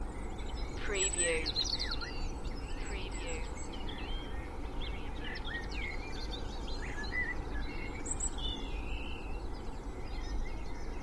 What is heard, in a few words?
Spring dawn chorus with distant traffic noise Cheshire UK; 2m30s; beanstalk audio